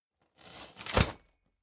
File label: boxing punching bag metal chain fall down; boxen